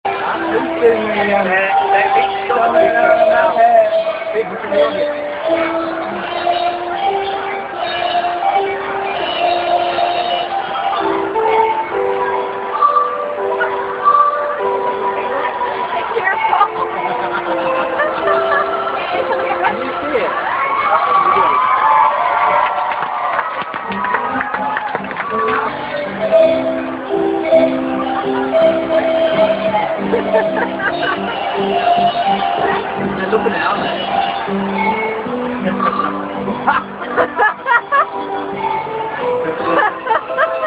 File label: sleighbells audience participation at leeds xmas market